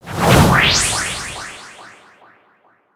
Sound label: Judgement Spell Cast; helltaker